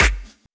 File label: Claps